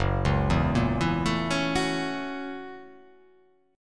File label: F sharp 7th Sharp 9th